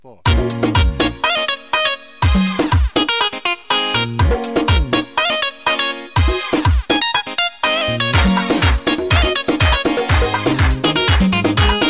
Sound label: Fancy Ringtone